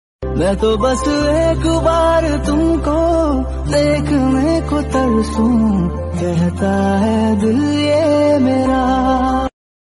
sad hindi song; bollywood

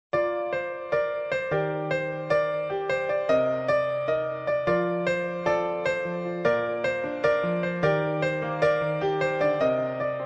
arabic ringtone

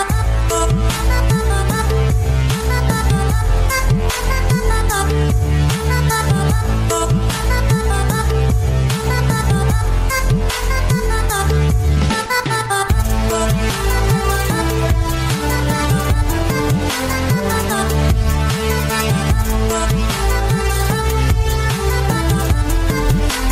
top music sound ringtone

